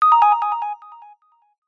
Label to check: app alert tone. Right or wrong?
Right